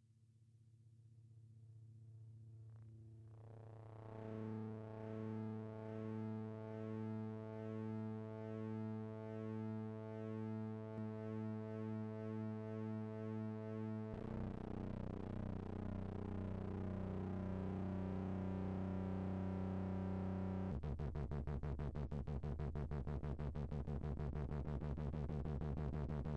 delay running out of control and feeding back, ping-pong on/off & the delay speed